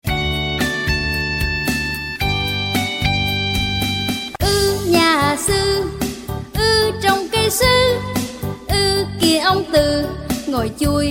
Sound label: music